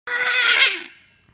noise

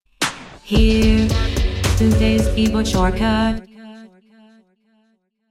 key shortcut jingle

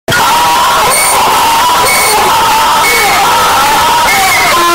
laugh it up earrape; fortnite

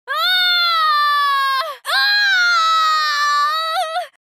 extreme boost impaled scream; mkx; cassie cage

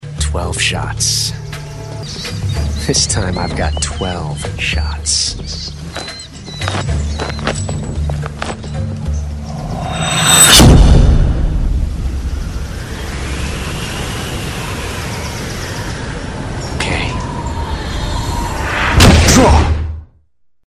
shots

